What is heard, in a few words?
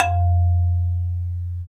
Bass Marimba